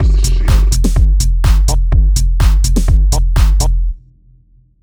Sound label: Techno Kit; Dynamik